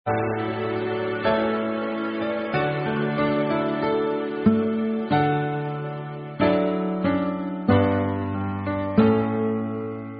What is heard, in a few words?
Karaoke Songs; Girl Scouts